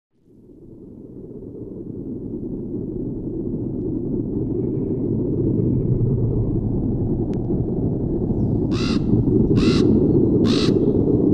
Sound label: noise for dripping